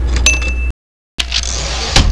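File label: Return with Bell; Annoying Key Sounds v1.2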